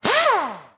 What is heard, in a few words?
Industry Tool Air Gun Run Short